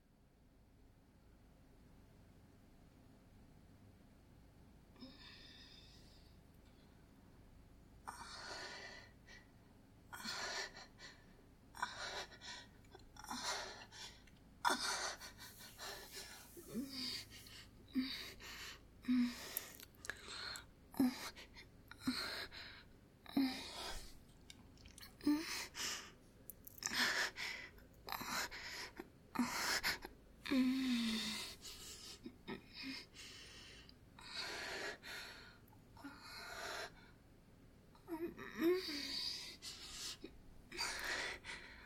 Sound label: Moaning from Behind